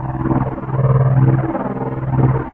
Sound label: laat Idle; 501st-Aux-Mod; RD501 Vehicles